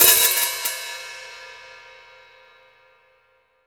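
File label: MIX open hi-hat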